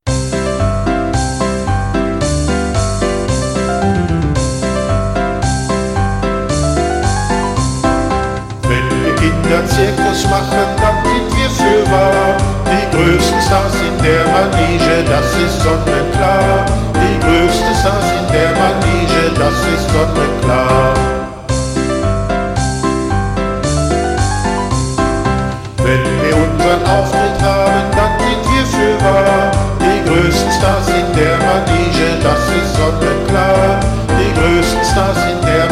zirkuslieder

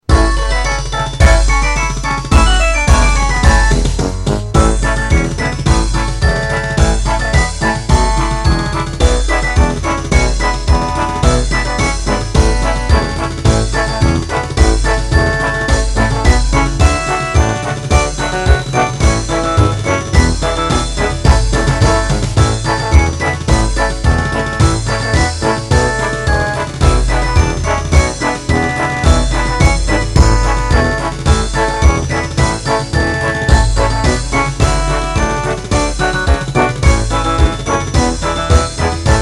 zirkuslieder karneval im zirkuszelt playback

